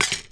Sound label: golf flagstick replace; ProStroke Golf World Tour 2007